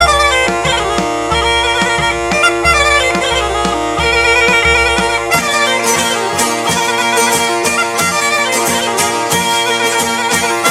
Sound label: Narodno steady 90bpm melody